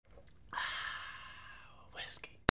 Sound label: PEOPLE